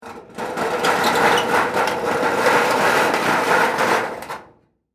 Metal Shelf Drag, Industry, Metal, Shelf, Drag, Move, Slide; Hand Tools; BlastWave